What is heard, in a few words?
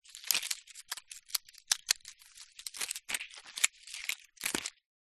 foley credit card cut up pieces handling